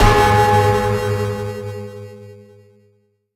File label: deadringer bell strike; Crypt of the NecroDancer